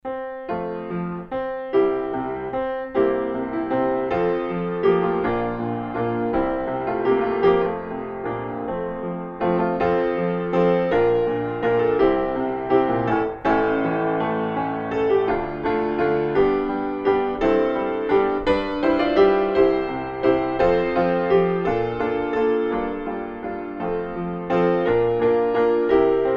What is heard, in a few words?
psalm 8 instrumental